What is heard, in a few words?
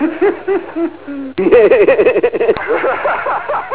laughs